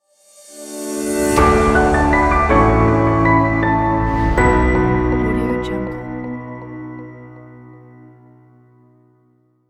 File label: Sting Logo Opener